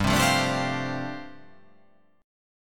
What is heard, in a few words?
G 9th Suspended 4th